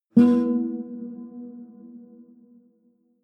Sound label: low string buzz dissonant high